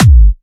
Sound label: Trance Kicks, Bassdrums